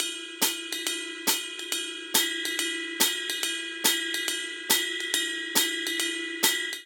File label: Swing Jazz Ride & Hihat 140 bpm; MIDI Clips; Ableton; Factory Packs; Drum Booth